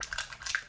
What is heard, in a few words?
Handling gun